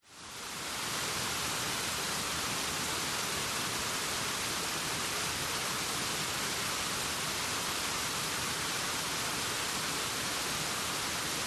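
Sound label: waterfall gentle rainforest jungle vietnam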